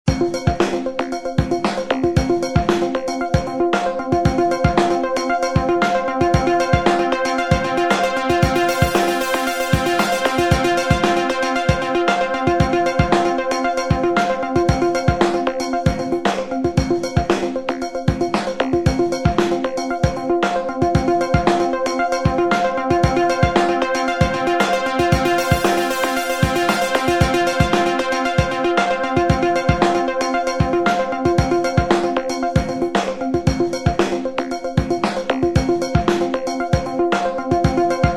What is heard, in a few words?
motivational workout music